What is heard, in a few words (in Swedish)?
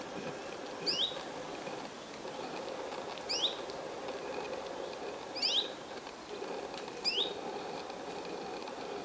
gransangare lock; fågelljud